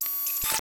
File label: nanotech robotic robot cyber tech nano micro movement mechanical servo; Sonniss GDC